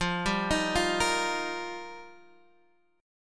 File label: F 7th Suspended 2nd Suspended 4th Guitar Half Step Down, chord